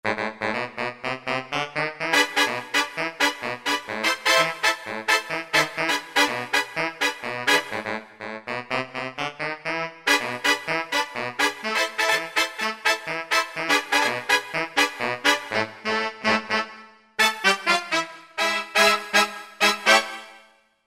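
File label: Trumpet & Sax Section; World; Latin; Roland SR-JV80-18 World Collection Latin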